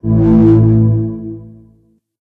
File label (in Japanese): 発見; misao